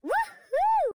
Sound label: Woman Woohoo; Zomboid; Lifestyle